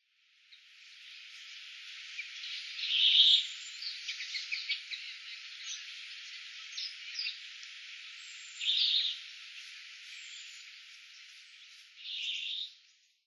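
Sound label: Brambling; atori; utsunomiya; koe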